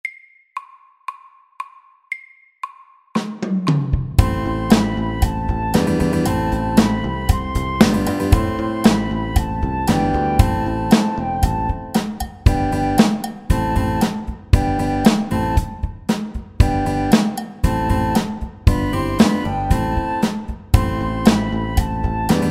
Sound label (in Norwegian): Crazy beat FORT